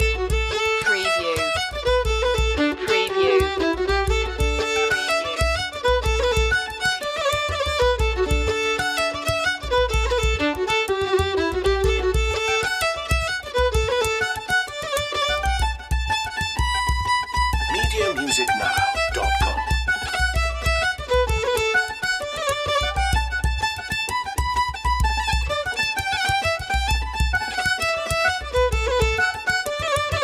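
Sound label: loop, folk dance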